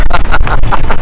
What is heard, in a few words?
hahahaha